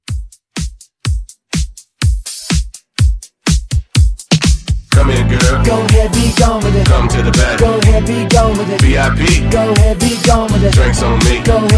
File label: Club Hype